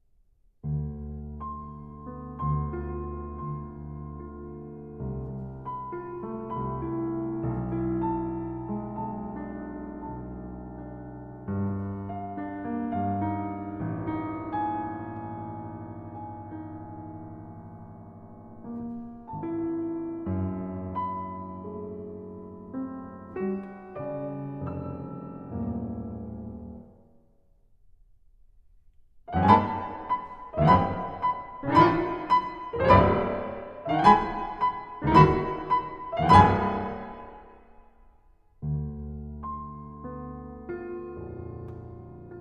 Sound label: Waltz For Two Pianos; Játékok Spiele Games, Band VIII (2010); János Pilinszky